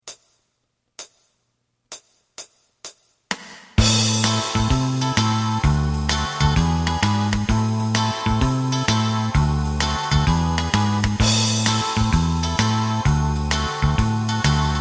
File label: lead